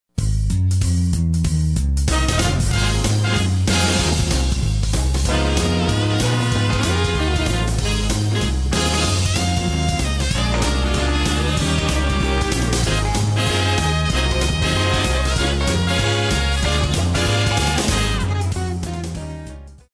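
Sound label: swing big band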